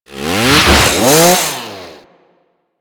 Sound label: Ghoul Sawman Lunge Attack; Warframe; Grineer Ghouls